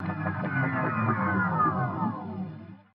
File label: Stopping